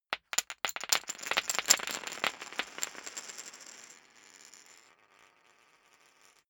poker chips drop

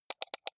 computer mouse left click double medium

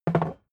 hammer wood put down

